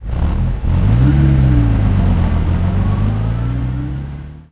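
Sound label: centurion engine interior load, tanks; engines; War Thunder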